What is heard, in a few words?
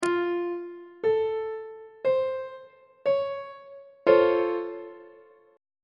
c sharp augmented major 7th 1st inversion on treble clef